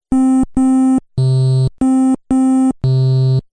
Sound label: triangle